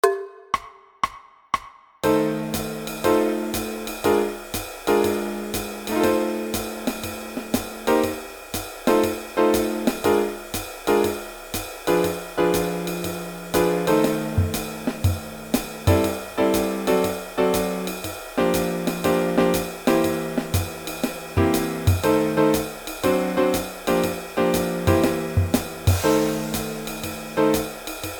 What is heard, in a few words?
Blues Minus one